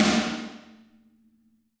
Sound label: Snare Crack; Drums; apulSoft apTrigga2; Steven Slate Drums